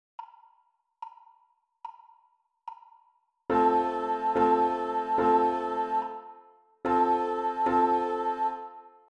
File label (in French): Rythme Bas Voix et Piano